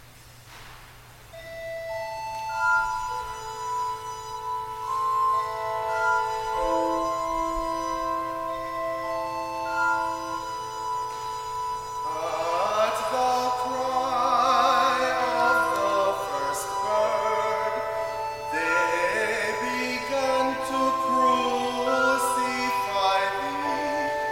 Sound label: Offertory Solo; CSUMC